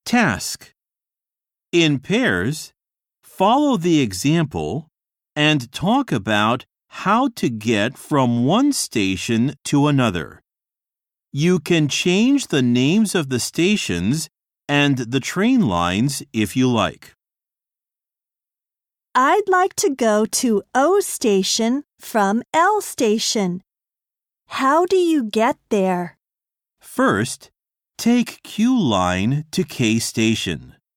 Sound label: harmony; 新課程英語教科書 指導用音声CD